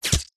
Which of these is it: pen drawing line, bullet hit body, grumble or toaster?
bullet hit body